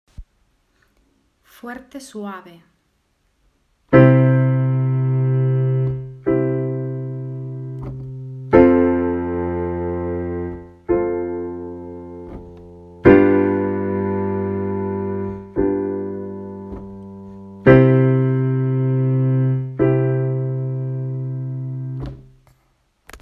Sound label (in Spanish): Sonidos Fuertes-suaves